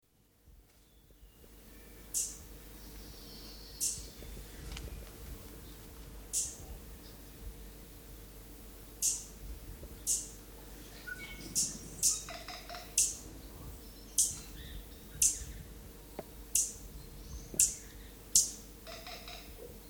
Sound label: stitchbird song; conservation, native animals, birds